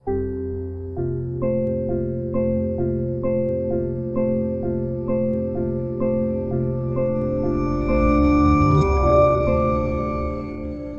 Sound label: Startup